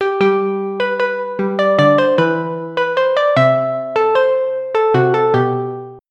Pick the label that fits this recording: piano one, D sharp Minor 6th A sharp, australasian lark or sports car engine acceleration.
piano one